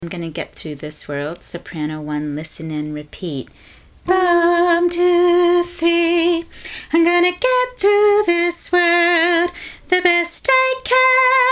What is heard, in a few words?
Soprano drill; Gonna Get Soprano 1 drill; Listen and repeat